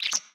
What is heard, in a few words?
editor error, ui; Source Engine; Portal 2